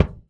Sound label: Dry, Kicks; Airwindows